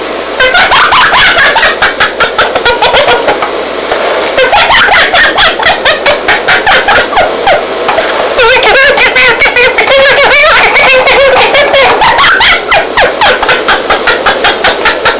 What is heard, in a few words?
laugh